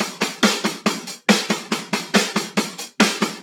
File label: Breakbeat 140 BPM